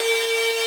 synth main

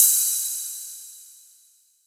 cymbal

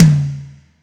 TOM

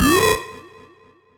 bass tek

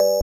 BELL; osu

